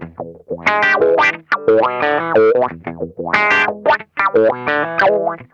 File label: CROAKIN, Funk Guitar; Zero G - Funk Guitar